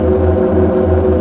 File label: idle, engine; Quantum Redshift; XBOX; kaitlyn